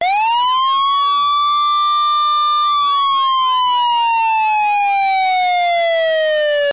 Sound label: wail conventional siren; DP3000; rmt 4001